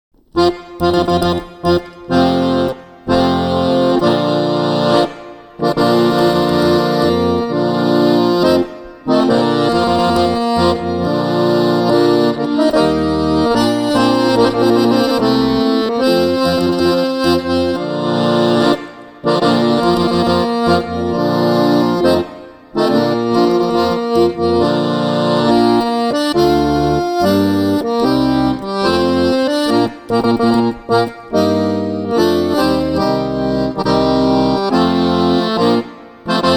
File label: Wedding March, accordion